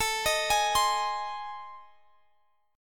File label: A Minor Major 7th Flat 5th, Guitar Half Step Down